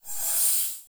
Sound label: Science Fiction Sci-Fi Electronic Cloaking Device